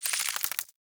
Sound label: Infested Ashen Move Turn; Warframe